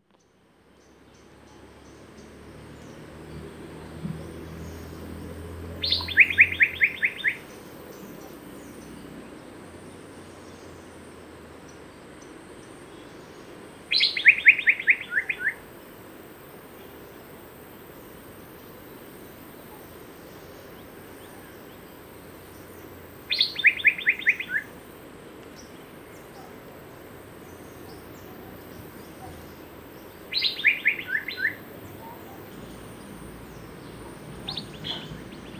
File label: asian fairy bluebird